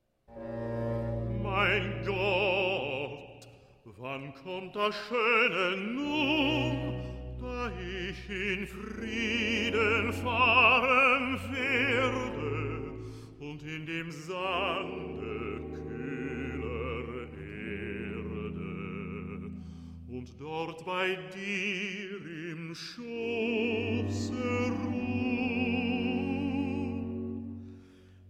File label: Recitative; Bach; Cantatas I